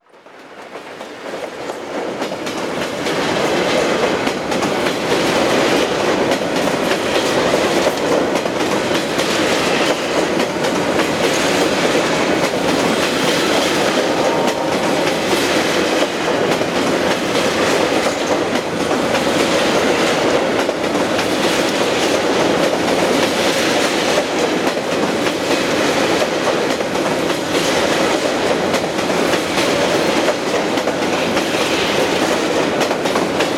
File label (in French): bruit joints de rails; sonotheque